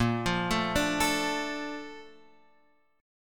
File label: Bb Major 7th, Guitar, Open F; x,5,5,4,5,4; arpeggiated